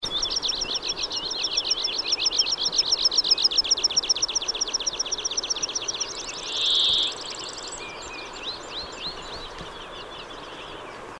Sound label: Anthus pratensis; 20.6.2006 Koshim - joki, Intan eteläpuolella, Komi, Venäjä; Laulua. / Komi, Russia. Song.